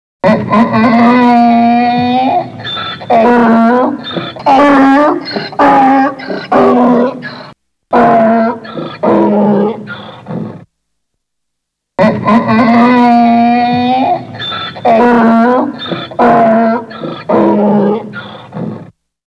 ane, animaux

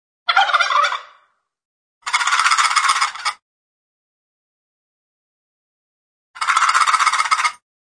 dindon

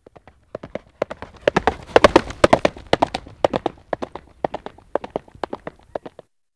cheval

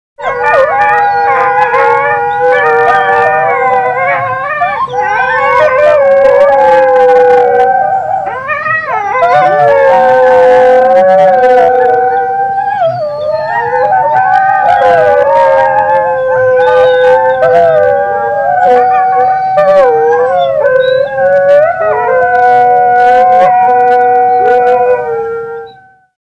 loups

